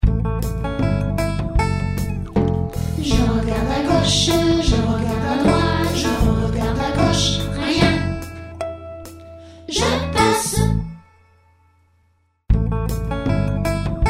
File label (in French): je regarde chante